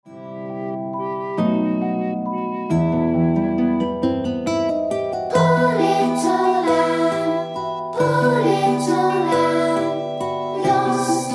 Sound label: Poor Little Lamb